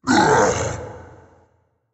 grunt, firegiant; smite

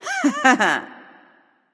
hehheh; smite; pele

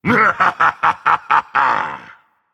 laugh, dragon; fafnir

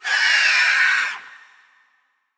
phoenix rebirth screech; smite; npc

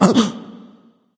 surprise; fafnir

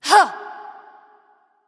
grunt; Smite; athena

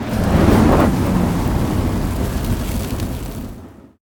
fire flames; smite; god merlin

